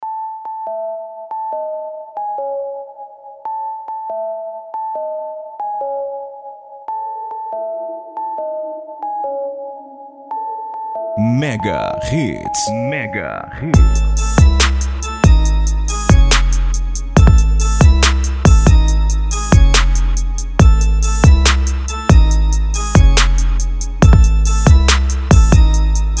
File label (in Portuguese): Instrumental Organ vinheta; DJ TOCO